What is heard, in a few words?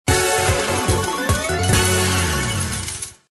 win; bonanza